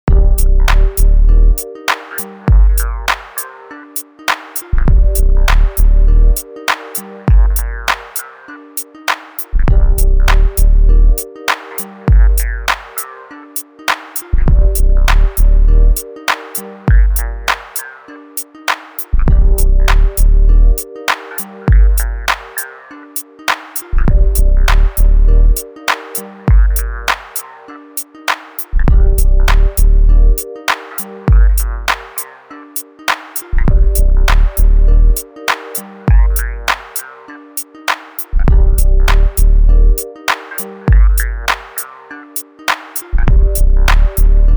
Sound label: C min 100 bpm